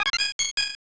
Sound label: 1-up!; Kirby's Dream Course